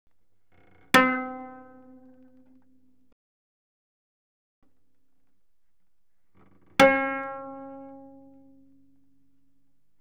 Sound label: Cello pizz ff sul A C4B4; Strings